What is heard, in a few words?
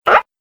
Nasty Little Monster; horror; Monster Sound Effects